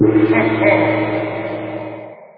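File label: gong single noisy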